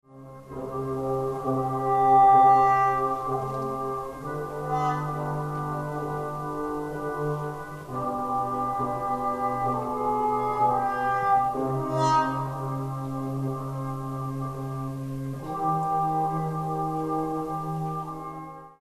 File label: duet